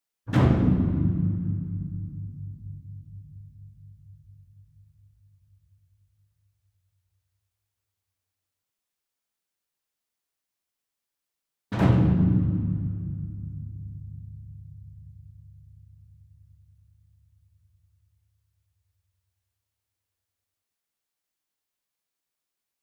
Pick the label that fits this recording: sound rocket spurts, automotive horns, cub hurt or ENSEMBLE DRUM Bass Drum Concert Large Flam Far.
ENSEMBLE DRUM Bass Drum Concert Large Flam Far